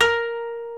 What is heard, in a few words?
koto A#3